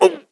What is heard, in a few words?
take damage small